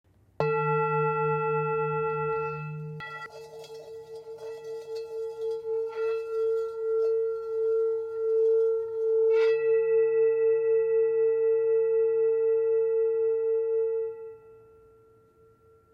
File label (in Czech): tibetska misa